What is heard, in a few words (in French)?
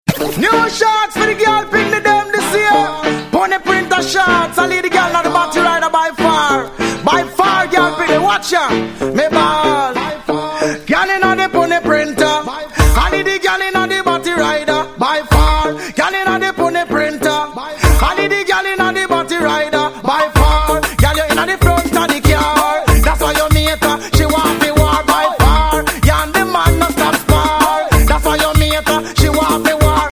Ragga mix sonnerie